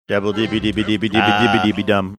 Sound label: singing portion